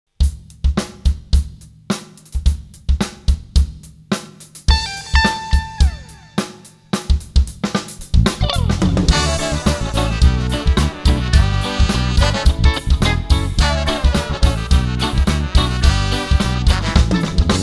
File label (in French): le reggae sample